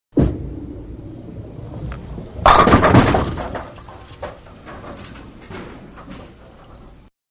Bowling-Bowl And Strike-Close To Pins; Sports; Manhattan Production Music

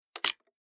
Splitting Wooden Create Tearing Splintering; Wood Sound Effects

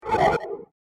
Fast Laser Boomerang; Laser Sound; science fiction sound effect; star wars / trek style games; Andrew Fleming

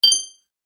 Game Item Activated; video game; Video Game Sound Effects

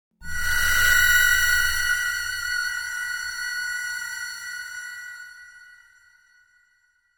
Metallic Scream, Harsh metallic scream.; Harsh (Sound Effects:Sound Design)

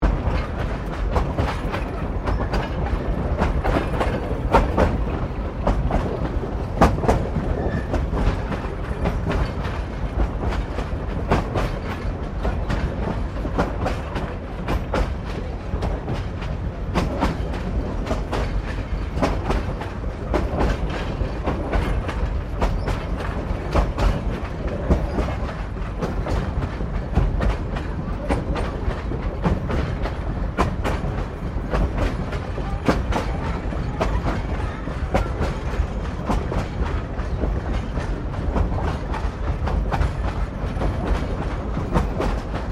Train Carriages 1937 Exterior Rolling Loop; Bellarine Tourist railway vehicles

